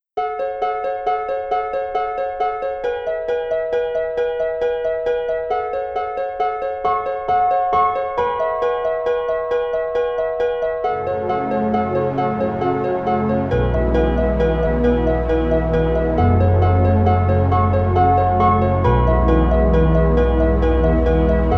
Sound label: colors of calm 432 hz